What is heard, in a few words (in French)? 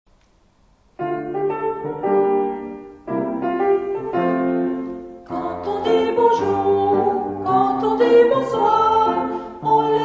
bonjour bonsoir chante